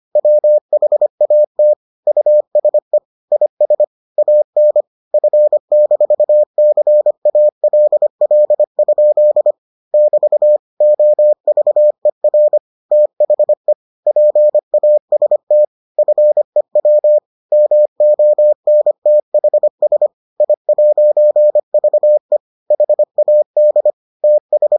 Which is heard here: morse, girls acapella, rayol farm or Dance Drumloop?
morse